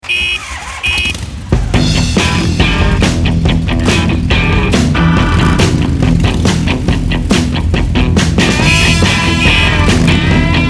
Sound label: Biker Rock, ringtones